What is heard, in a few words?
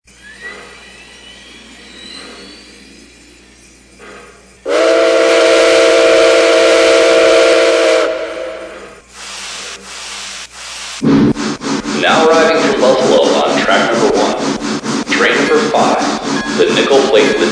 Berkshire, electric trains; NKPX